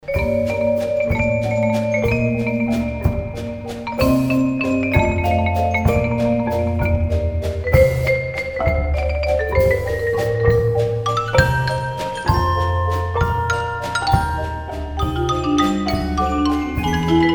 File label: marimbamix; ironija sudby